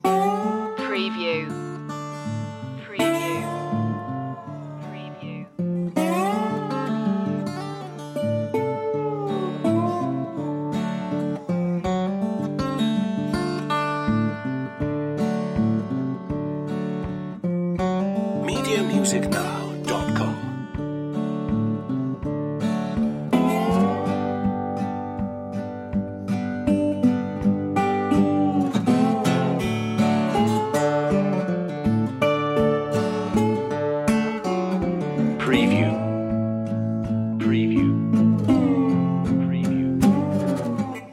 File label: soul broke blues loop